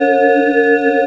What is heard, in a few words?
Nuclear Scanner, Sci Fi; 1001 Sound Effects